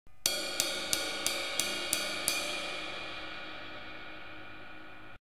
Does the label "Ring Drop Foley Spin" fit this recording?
No